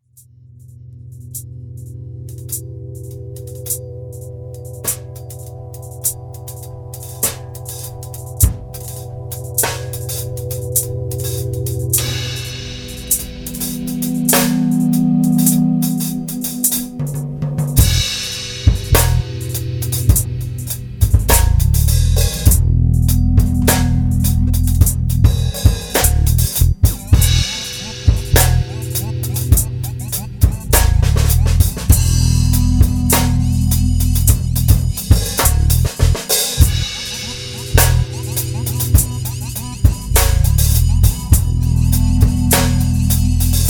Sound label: thunder dub; Compendium